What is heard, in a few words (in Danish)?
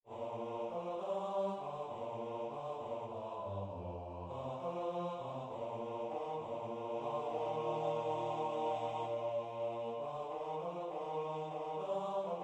bas; Glade jul